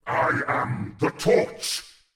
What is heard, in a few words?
torch